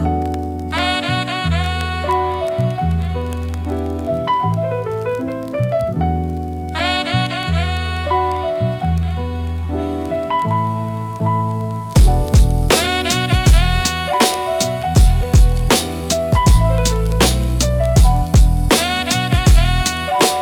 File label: beat; velvet jazz chords